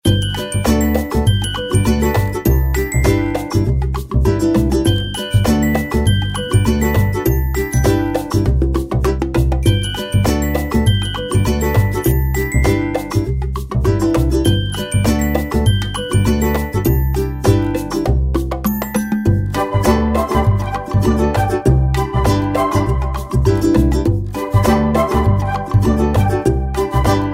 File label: Pickled Pink Alarm, suoneria